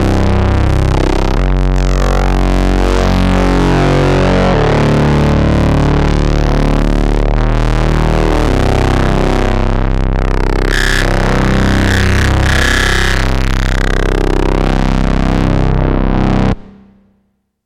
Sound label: bass; casio xw-p1